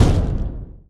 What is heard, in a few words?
EXPLOSION Short Smooth Crackle stereo, Enemy